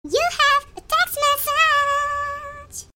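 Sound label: Text Message; ringtones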